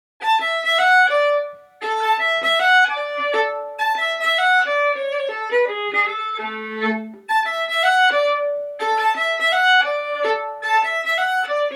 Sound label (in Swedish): intro Ett Slags Schottis